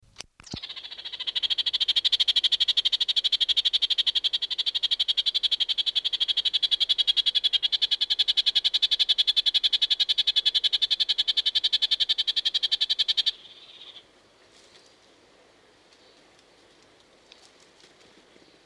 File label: red crowned parakeet song; conservation, native animals, birds, bird song